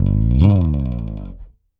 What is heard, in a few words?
Slide G#, Bass; Bass X